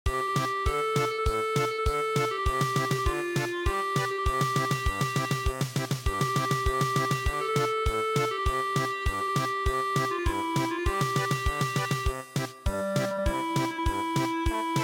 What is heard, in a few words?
German Polka